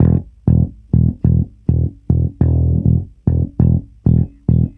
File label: Bass Loop, Ambient Grooves